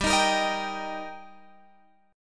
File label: G Minor Sharp 5th G Guitar Drop D, chord